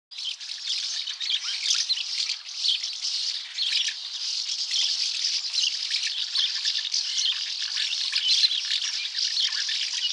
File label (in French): Hirondelle fenetre; Le cri de l'hirondelle des fenêtres